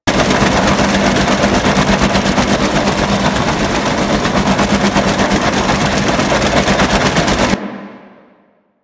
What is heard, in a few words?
Radar Ship Machine Spin Loop; Warframe; Ambience; Grineer Railjack POIs